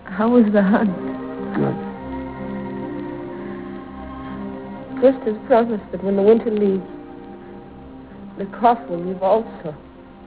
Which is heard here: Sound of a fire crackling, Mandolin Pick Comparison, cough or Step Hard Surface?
cough